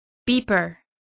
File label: beeper